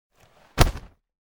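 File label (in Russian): мешок упал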